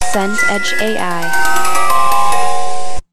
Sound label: horror music box broken